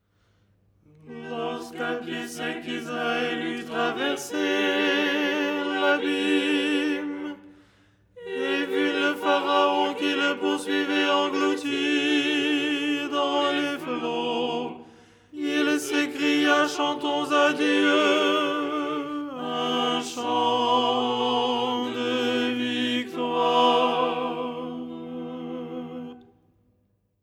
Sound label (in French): tenor; 1er hirmos du canon du dimanche; Les 8 tons en français; ton 6